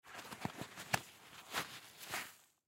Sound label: Lacrosse Glove On, Lacrosse Glove Put On; Equipment; Sports